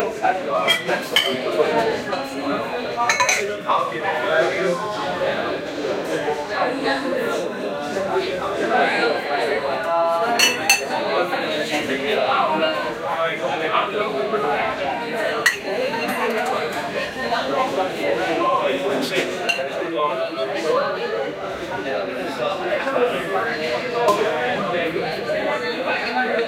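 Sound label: british pub ambience glasses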